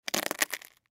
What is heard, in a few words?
Potato Chip Step On Crunch Junk Food; Household; Foley; Blastwave